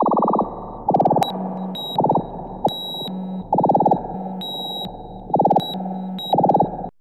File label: TALK FX; AMG - Vince Clarke Lucky Bastard